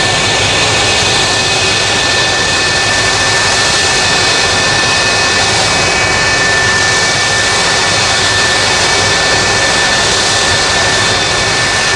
running, Phantom FGR.2; FGUK

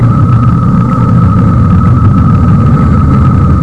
vulcan, Avro Vulcan B.2; FGUK

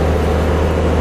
R44 Engine, Robinson; FGUK; Robinson-R66

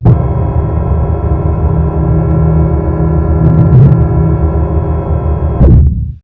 flaps; FGUK

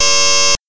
R44 Beep; FGUK; Robinson-R66

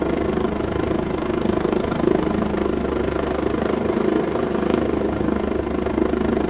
Vortex In left; AS350; H145; IGE; FGUK

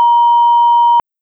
beep warning, Folland Gnat T.1; FGUK

